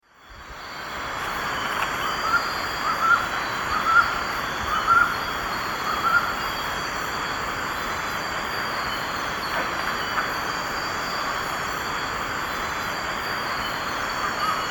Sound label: Bucco capensis, song; S. America; Eastern Lowlands